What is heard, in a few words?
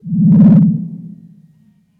Power